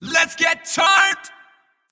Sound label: Dubstep; Dubstep Janus; Smite